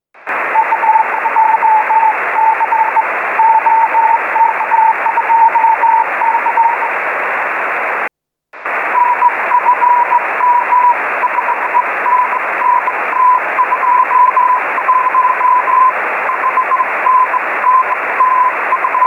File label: CW; OK2C